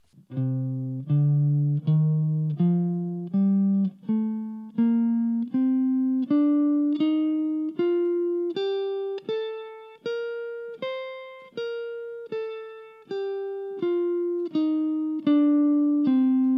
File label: C Dorian, guitar